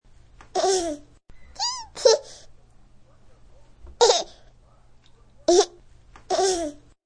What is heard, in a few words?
Baby Laughing, High quality stereo recording of a baby laughing; Laughter